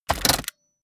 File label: sci-fi click metal heavy